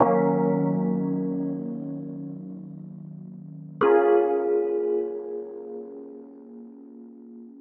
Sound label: 126 Eb Bb MUSIC; funky; Loopmasters; Funky Underground Vol 2